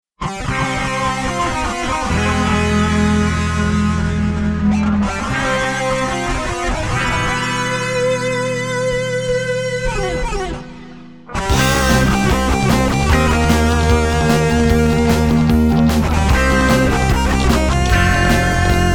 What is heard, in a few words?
Instrumental